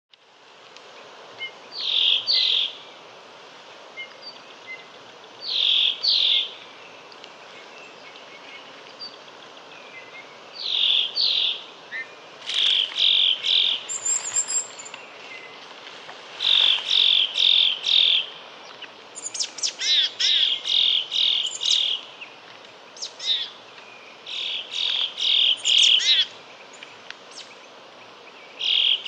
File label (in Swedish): lappmes, fagelljud